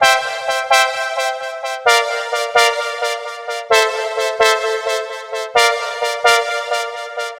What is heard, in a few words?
80s Flav Bras A